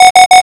alarm

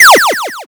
laser

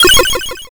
glitch

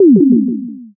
poyon

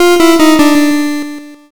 lose

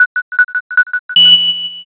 countdown

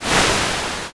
swoosh

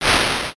swoosh

